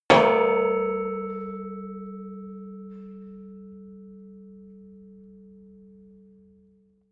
metal impact girder long

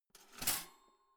toaster pull in; maschinen

